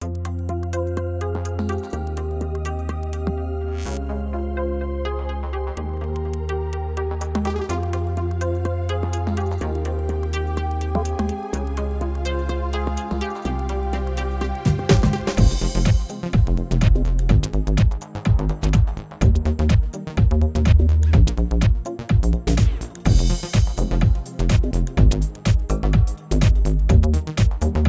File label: style dance electronica, yamaha tyros3